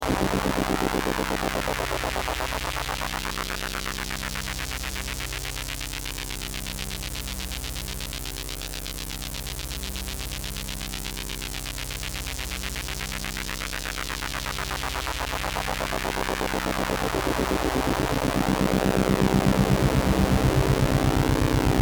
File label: no res adsr lfo HP; state var vcf; analog synth